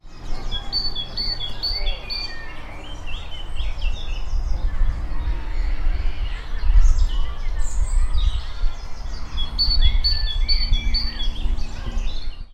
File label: birdsong